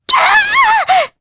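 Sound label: Damage, Japanese; voices; heroes; Mirianne; kingsraid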